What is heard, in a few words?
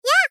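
Moe, Attack, "Yaa", Japanese female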